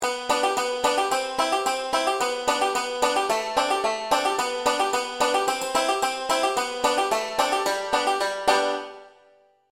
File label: basic w melody, banjo; 5 string; boil cabbage